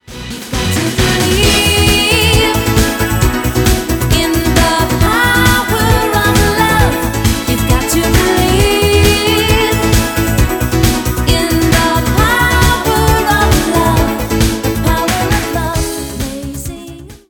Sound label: Ringtones